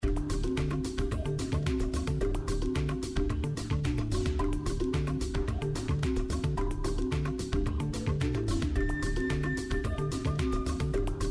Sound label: BGM Paytable; slots; Wildlife Adventure